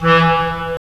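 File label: wind clarinet; Roland - LA Composer vol. 3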